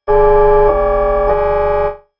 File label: bassoon